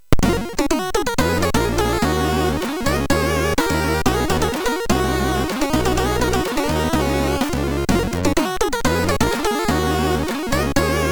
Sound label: end, MOS6581; Illmatic; MOS6581R3